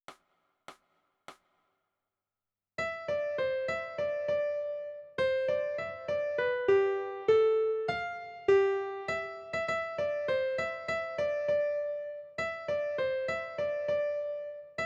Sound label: Melody